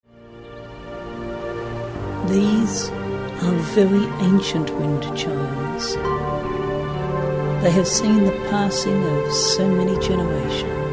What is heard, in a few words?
The Wind Chimes of Kashmir